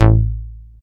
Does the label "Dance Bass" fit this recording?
Yes